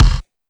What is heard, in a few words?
Kick, Yamaha DD-20